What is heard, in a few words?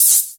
Cymbals HH Open; Vengeance Samples